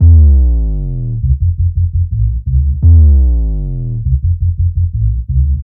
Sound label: Big Bass, Drum & Bass; Fluid Dynamics